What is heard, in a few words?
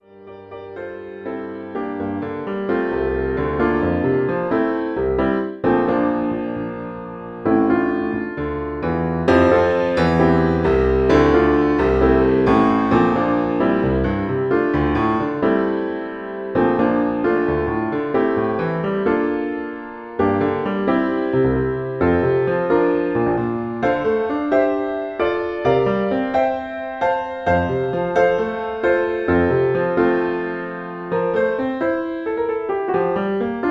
advanced piano solo